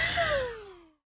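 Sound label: gun minigun end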